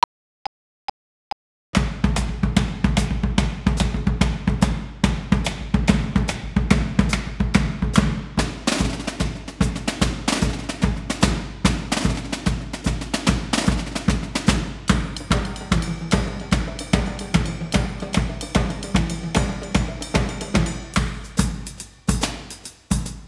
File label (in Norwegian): Trommesolo